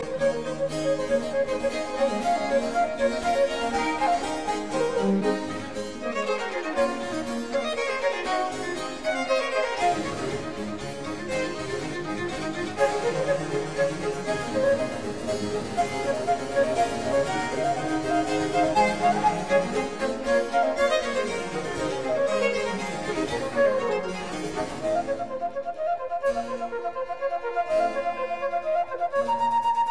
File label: Quartet Allegro; Quatrieme livre de 6 quatuors; No 1 in D major; TWV 43D4